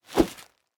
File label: minion swing; smite; npc; roman archer